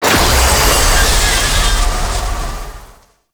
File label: Medusa Puzzle Orb Path; Warframe; Duviri